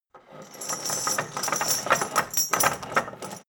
drawbridge chain handle; soundpool

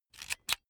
electric drill template slide in medium; maschinen; bohrer; soundpool

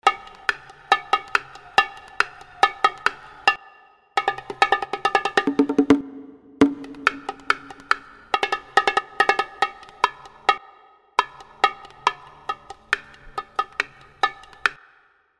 oriental percussion; music elements; grooves

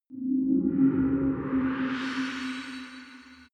far planet beaming; energie

